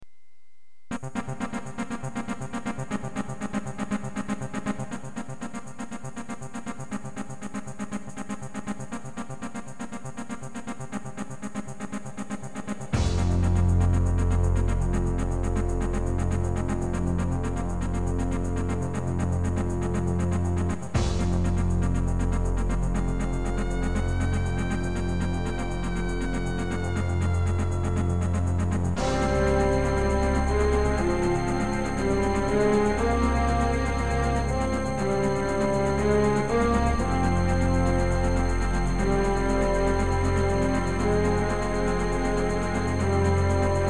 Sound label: Creative Sound Blaster AWE64 hardware; soundcardrecordings; Abuse